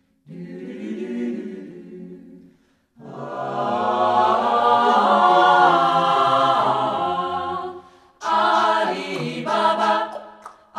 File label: ssatb